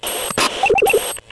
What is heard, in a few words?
Tune; Cosmic Rage